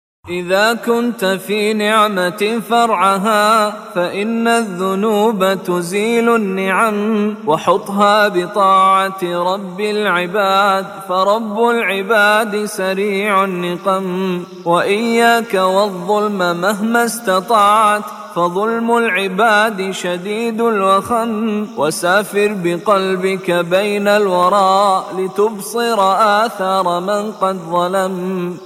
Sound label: islamic tone ringtone